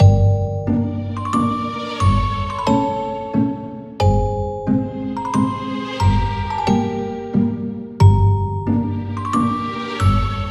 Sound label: sneaking around; Dodging Fire Pygame Zero Beginner Tutorial